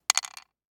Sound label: DICE on Wooden Tray, Throw and Roll, Mini, 2 Two Dice; Samples; ArticulatedSounds; DICE Sfx Party (Extended)